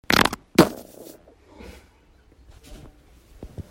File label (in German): furz